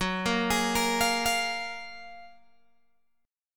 F sharp Major 9th F sharp, Guitar Open G

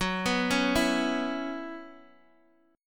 Gb 7th Suspended 4th Gb, Guitar Open G; chordshape; down

